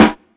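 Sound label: clean snare single hit a key, tuned to the A note; snare drum samples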